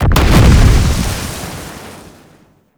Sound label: explosion; Warframe; The Teacher; Mod Quest; Dojo